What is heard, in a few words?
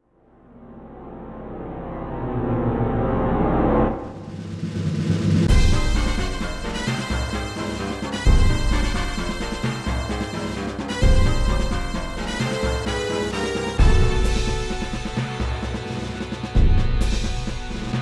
Shudder (Creaking Skull); Castlevania Legacy of Darkness; N64